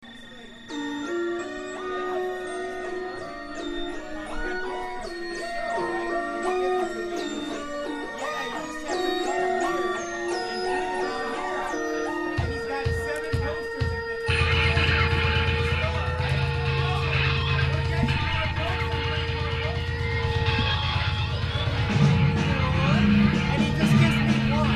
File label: bass